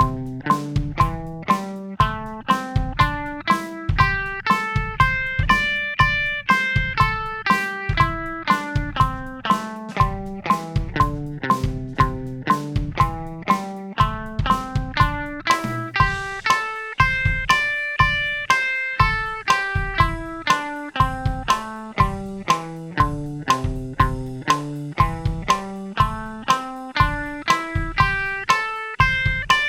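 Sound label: Am 120 bpm; A Minor Pentatonics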